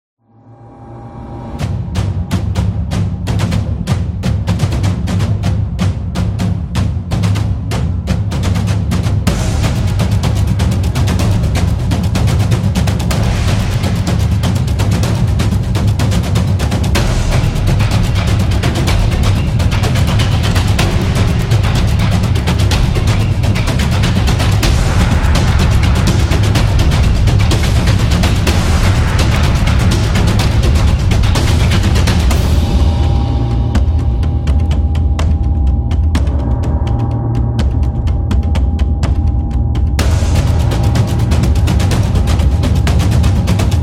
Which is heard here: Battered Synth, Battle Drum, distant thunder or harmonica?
Battle Drum